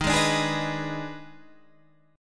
D sharp Major 7th Suspended 4th Sharp 5th D sharp Guitar Standard, chord; strummed; 11,11,12,13,12,11; JGuitar